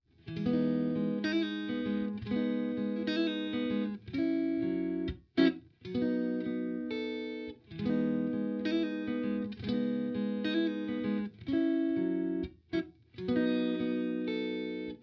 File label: st white m clean front